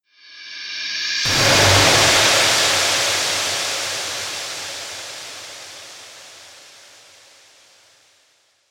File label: Trailer Hit Horror Strike, Trailer Sound Effects